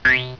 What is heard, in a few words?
boing